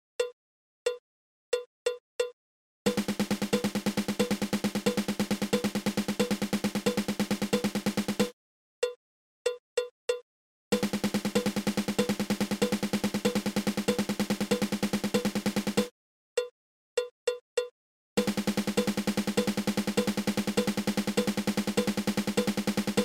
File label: Triple Stroke Roll